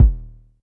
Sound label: Polite kicks long D#1; LIMAT Div Dept; Drums